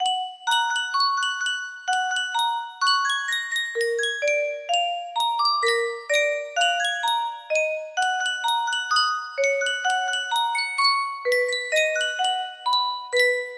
music box

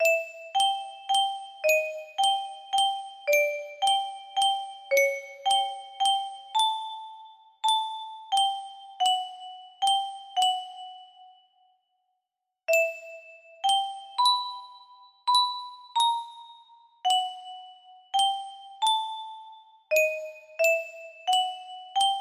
music box, music box melody

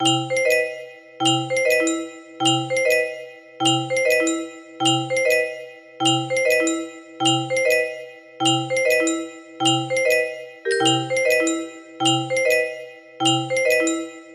melody

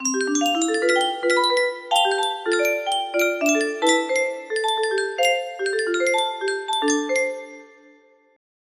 melody, music box

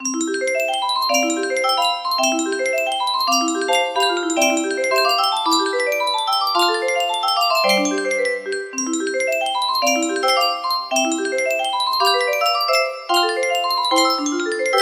melody, music box

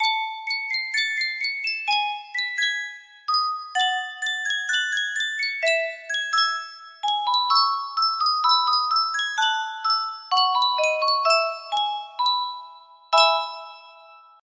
yunsheng music box

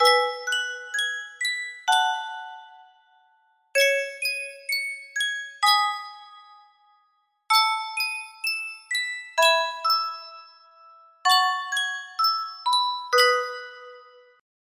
Yunsheng Music Box; earthbound

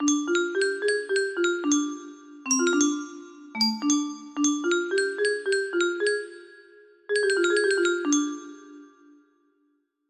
music box, music box melody

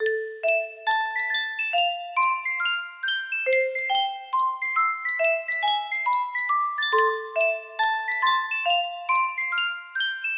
sankyo music box; moana

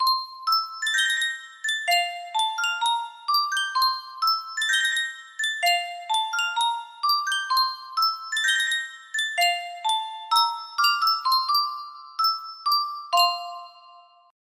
sankyo custom tune music box, music box melody

